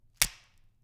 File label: Celery Snap